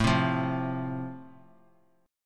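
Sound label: A Minor Sharp 5th, chord strum; Am#5 strummed; x,12,10,10; JGuitar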